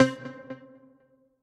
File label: Electric Pop, Guitar & Plucked; Ableton; Factory Packs; Drive and Glow